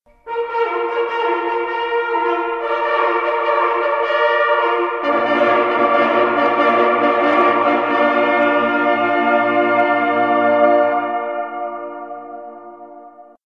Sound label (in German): Halali